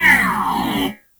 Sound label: UMP Wind Down, Weapons